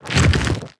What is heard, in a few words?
step movement, zombie blind, foley; creatures